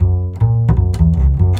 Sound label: BASS, Drum & Bass, 150 BPM; Ueberschall; Drum & Bass Flash Trackz